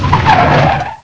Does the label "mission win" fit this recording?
No